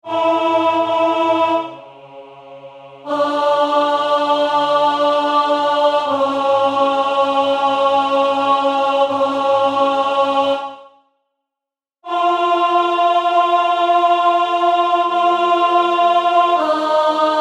Adagio2 Tenor; Salve Regina

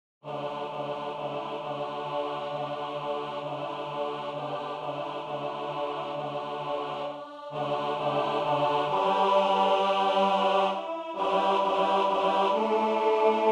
Bass; La sera sper il lag

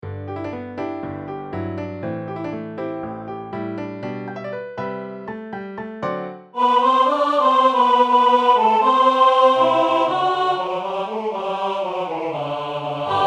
Night Tutti

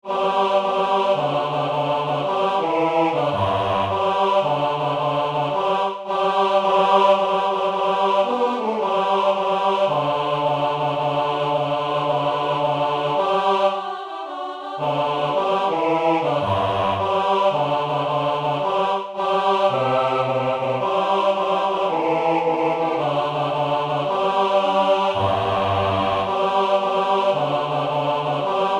Bass, Swing; Polonaise

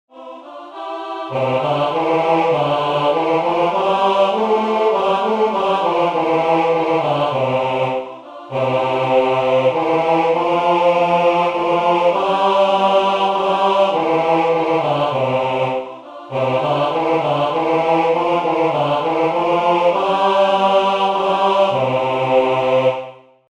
Bass; 's isch mer alles äis Ding; Schweiz

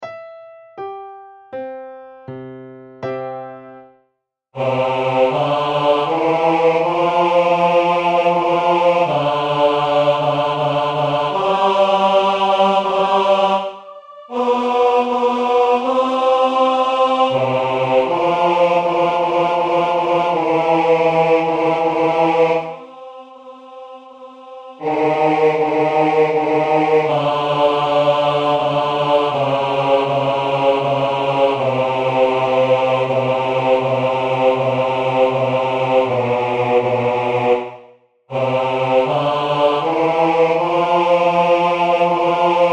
Largo Bass; Salve Regina

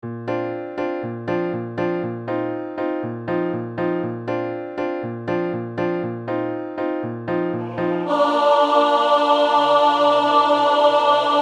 Tenor; West Side Story

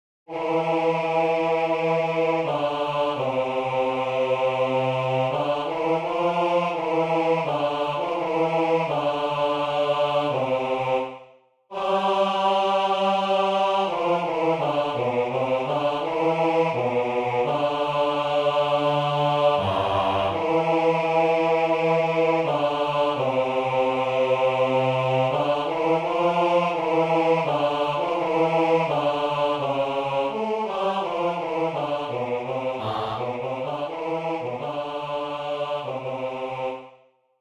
Bass; Requiem

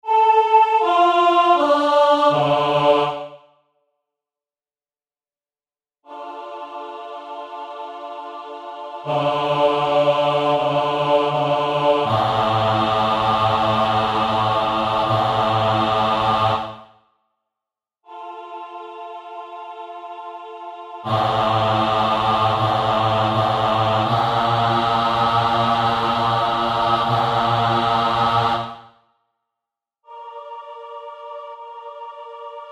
Adagio Bass; SalveRegina

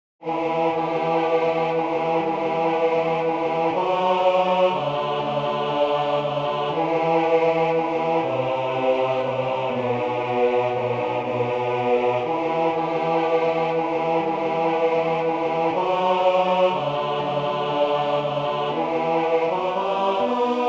Green Bass; England

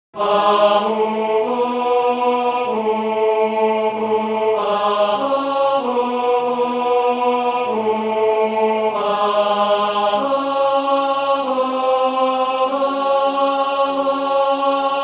Tenor; Allein Gott in der Höh; Paulus

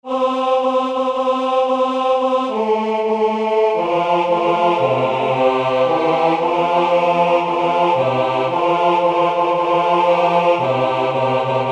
Bass; Swing; Intrada

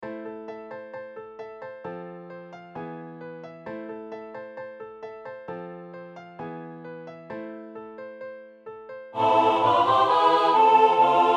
Tutti

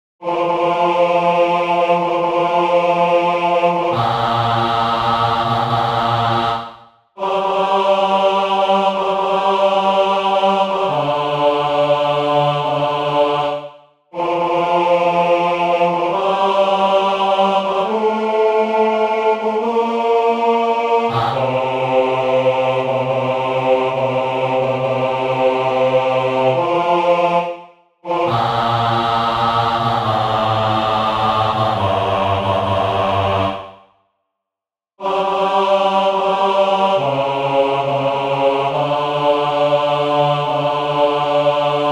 Bass; LaVergine; Italien

